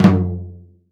FLAM, 30S JAZZ; Northstar - New Gold VOL-1

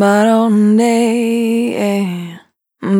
Spin Dry, Single Voice Melodic Loops

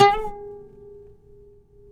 PLK OUD, Roland LA Composer; Roland - LA Composer vol. 3

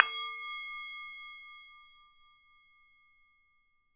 Tibet Bowl; Polyend; Simulacrum Sounds; 1 Shots; Acoustic and Electric; Drums

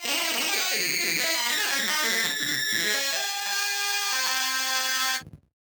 Casio, Glitched, malfunctioning synth

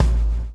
BLOW; Spectrasonics - Backbeat

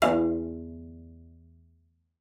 E2, CELLO FINGER; Xsample VOL-5

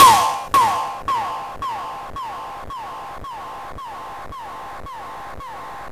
TOM DIS DIS 1 R, ELEC DRUM; USB Soundscan vol.20; Fresh Disco House I; AKAI

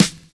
VINYL SD, Drums Dance & Groove; USB Soundscan vol.11; AKAI; VINYLKIT

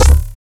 XTRA PERC

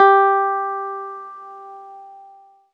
ZITHER; Best Service; Alpine Volksmusik

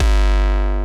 DC Weekender Bass, breakcore

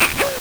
LSDj, Nintendo Gameboy; 300 Drum Machines

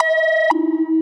Sci-Fi Lasers - Scan; Antidote Advent

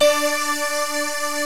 MULTI SYNTH

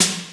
SD, DRUMS; Crypton - DrumBase5000; CD DRUMS #1

